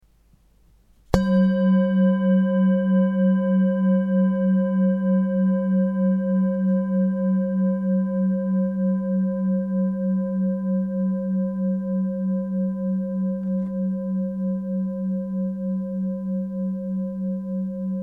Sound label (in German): Klangschale